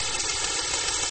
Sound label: spraying sprinklers; gridtracker